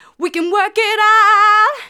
Female vocals and robo-vox

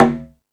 Latin Layers, Drum Machines E-MU Pro-Cussion

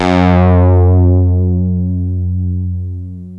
PPG WAVE, OLD SYNTHS; Rubber Chicken Software Sample Bank

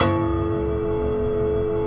Pno Hybrid; E-MU Producer Series Vol. 5 – 3-D Audio Collection; 3DSprints; 3DPnoHybrid

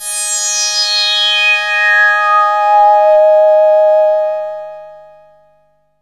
RES FALL G#4, RESO NOIS FALL; Best Service Alpha Dance vol.2

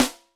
HR16 SD; Crypton - DrumBase5000

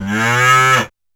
F3 Cow, Yamaha PSR-E323 Sound Effect Kit

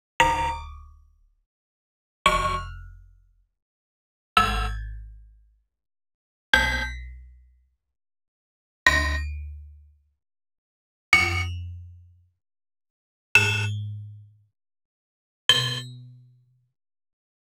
Left To Right Perc D+3; FX & Atmo; Klaus Schulze Collection

